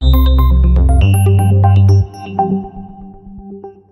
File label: audio password tone